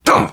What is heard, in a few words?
hurt minor, survivor voice, biker